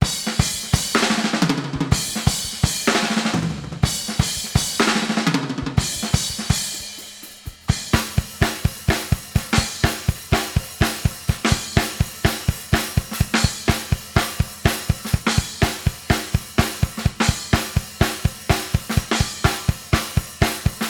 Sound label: processed drums